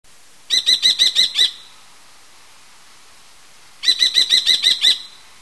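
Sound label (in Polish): pustułka, głosy; falconi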